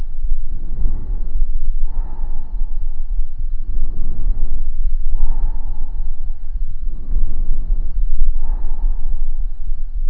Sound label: bronchial